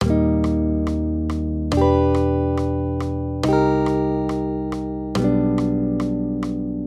Accompaniment, Major Triads, Root Movement Four Semitones, Eb-G 140